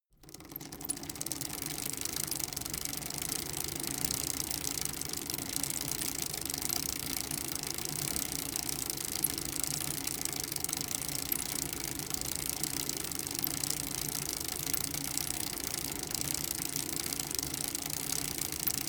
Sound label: bicycle rickety freewheel slow